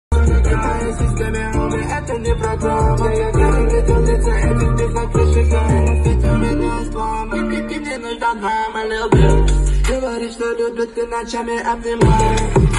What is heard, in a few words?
trap luv